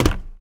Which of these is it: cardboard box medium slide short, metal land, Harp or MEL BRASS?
metal land